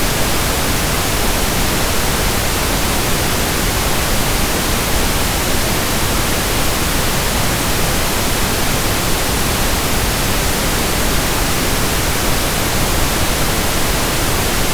Pink Noise; Burn in & Sample CD; Burn-In Man

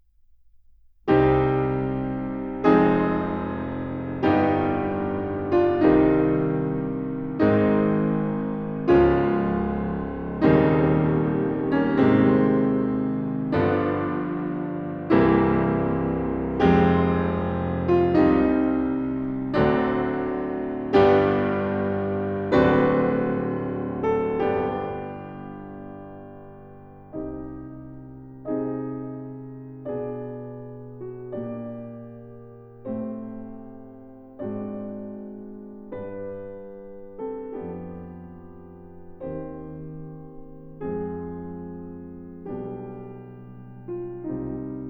Prelude in C minor, Piano; Chopin; Preludes

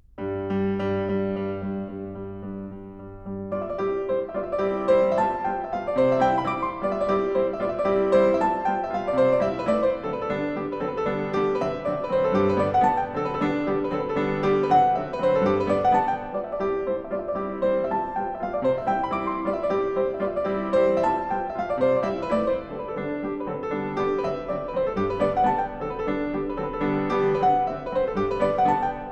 Mazurka in C, Piano

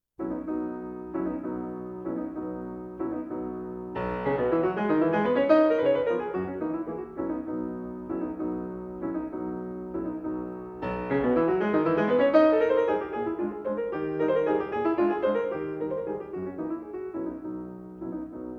Mazurka in B major, Piano

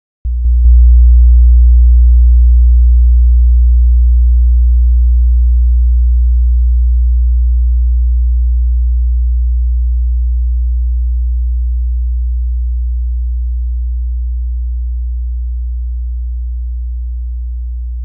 Demagnetizing Fade; XLO - Test & Burn in CD (Gold); XLO Reference Recordings

